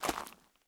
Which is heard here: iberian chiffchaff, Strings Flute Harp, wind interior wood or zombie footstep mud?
zombie footstep mud